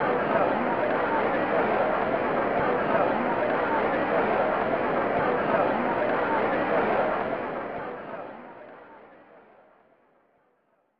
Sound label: Crowd Murmur; snes; super rbi baseball